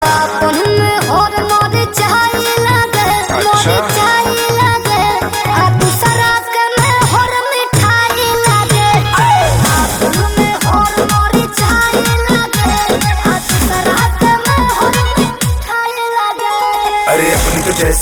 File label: Ringtone